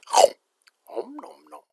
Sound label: monster chomp